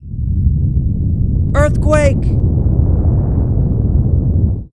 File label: Quake